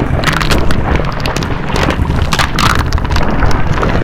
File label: Lava Loop Rocks; angry birds